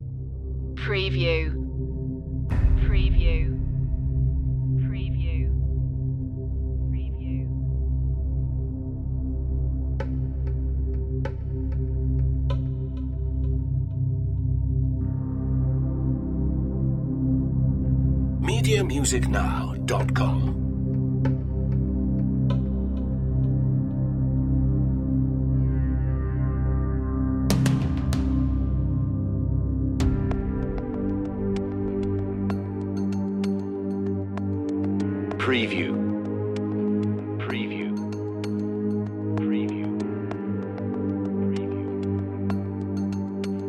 drum and bass

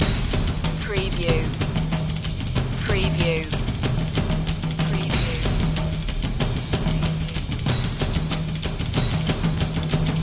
onslaught percussion loop

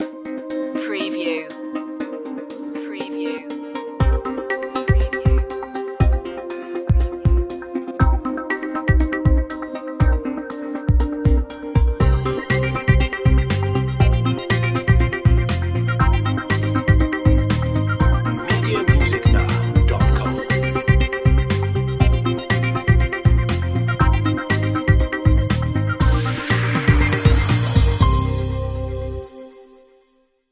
smart beat

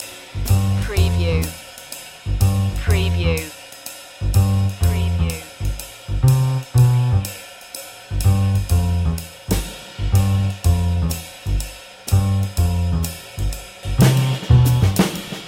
lounge lizard loop

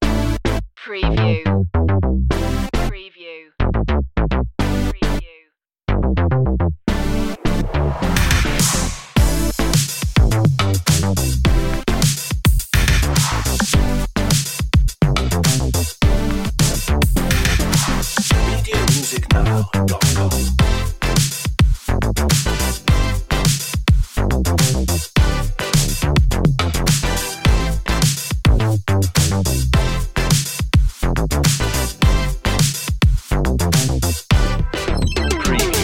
beat machine underscore

